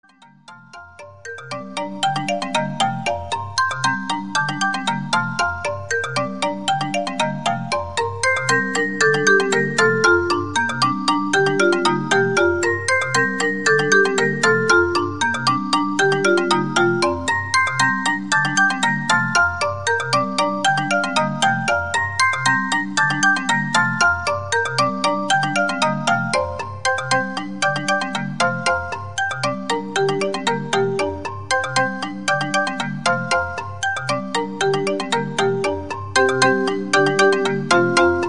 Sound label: quartet